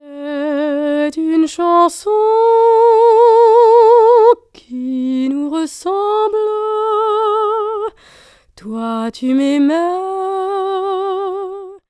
singing original

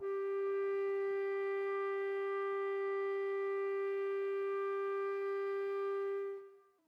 flute clarinet; interpolator

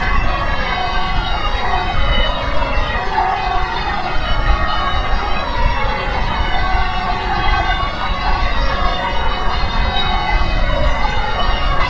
bells

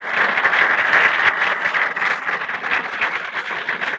applause

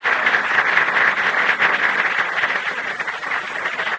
applause

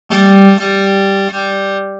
string; Modalys

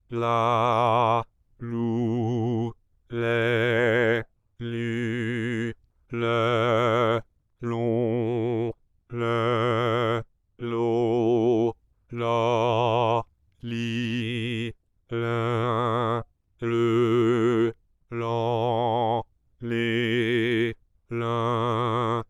vowels scaled

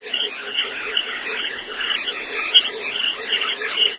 birds

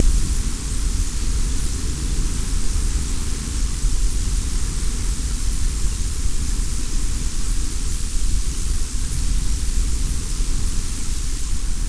wind

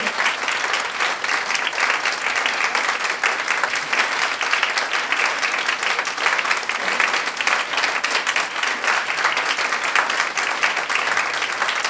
applause

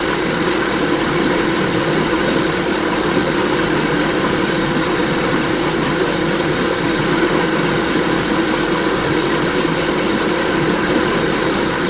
blender

